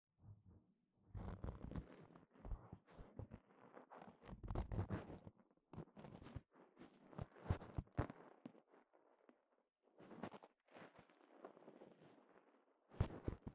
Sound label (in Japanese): 竹耳かき 奥; 3DIO; 耳かきに関する音